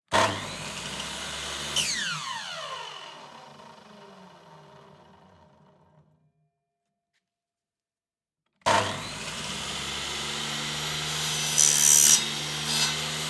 Buzz Saw

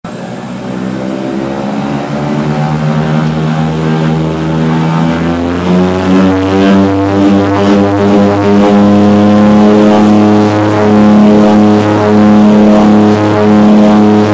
Twin otter

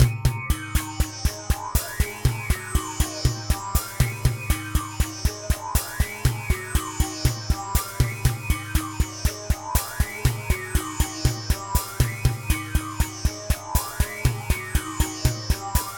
Hippie Trippy Loop

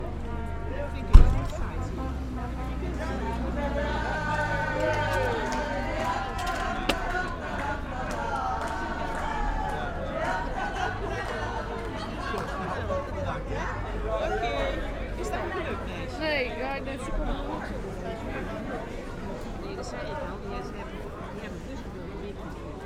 Group People Fun Sing City

